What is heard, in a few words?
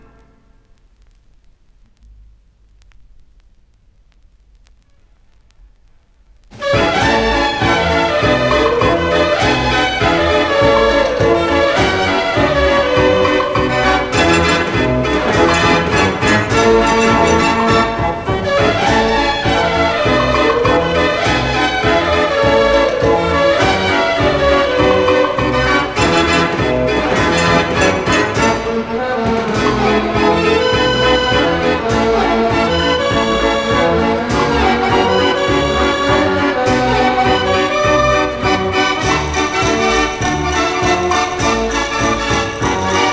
draaiorgel; de kempenaer